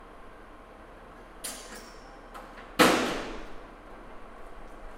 Industrial

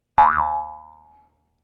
Jews Harp Boing

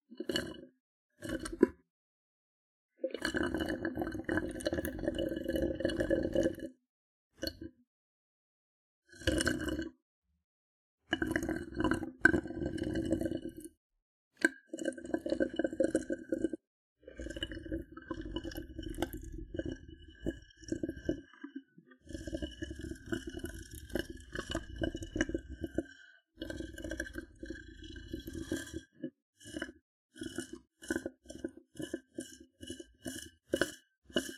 Sipping Straw Variations

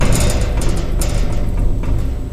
Porte Tamb

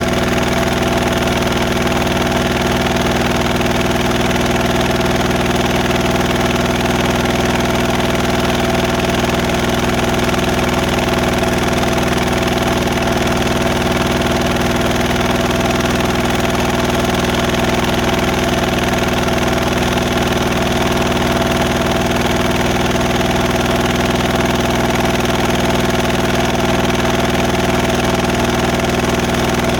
Sound Of A Small Tractor

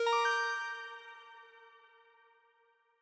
Ui Sounds Shimmering Success

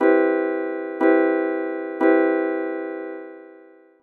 C Major Seventh

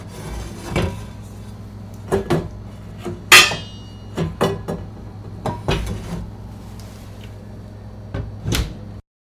Dishes

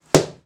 Boing

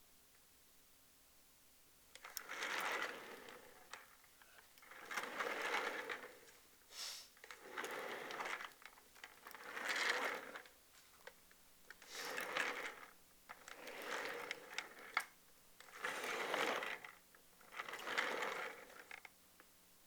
Chair Rolling

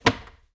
Drawer Wood Hit Close

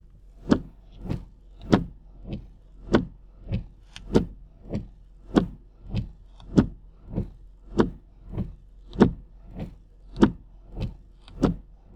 Windshield wipers No Rain

